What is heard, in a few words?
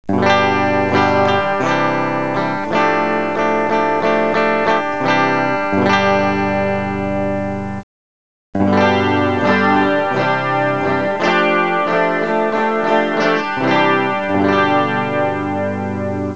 chorus